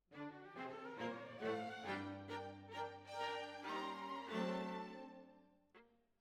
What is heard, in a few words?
Violas Over